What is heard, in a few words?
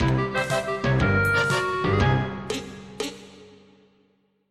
Gag; ycrpg